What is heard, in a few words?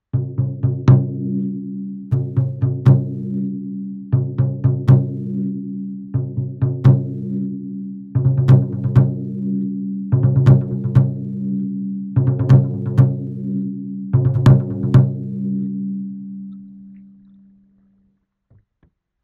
Taiko